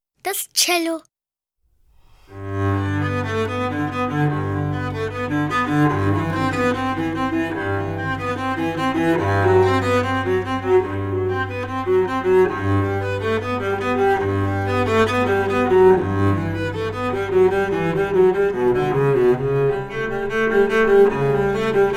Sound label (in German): Violoncello - Cello; Bilder einer Ausstellung